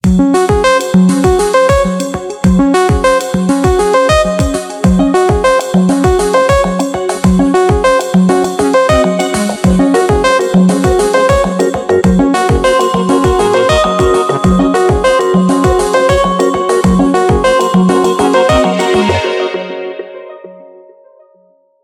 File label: Modern, ringtones